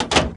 battery place

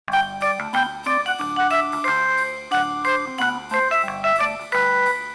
elevator

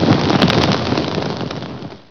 fireball end

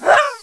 Female jab

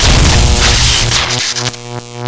electrocute mega

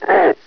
pain; hunter

